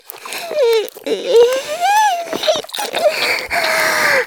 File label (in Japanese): 絶頂 中出し; 騎乗位